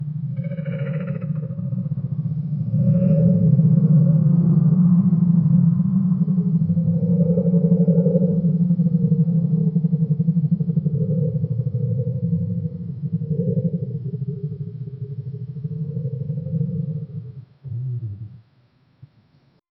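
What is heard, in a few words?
tiger fighting with prey; aggression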